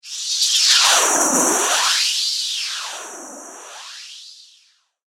Laser Hollow And Whispy, sci-fi laser sound effect; Andrew Fleming